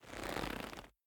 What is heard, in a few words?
Leather Foley; Devour; The Town; devour 2021 gamerip pc macos